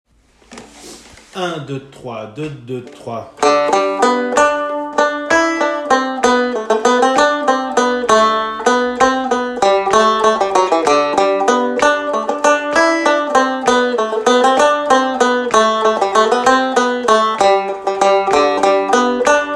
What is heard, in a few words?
Valse